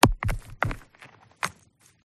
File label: rocks impact hit single stone; PMSFX